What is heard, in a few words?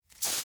spray shot; anemoi